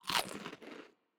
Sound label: Consume Bread Stereo